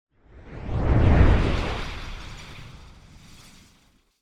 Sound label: Jet By Processed - Passing Space Ship - Deep Engine; Hollywood Edge; sound designer's toolkit v2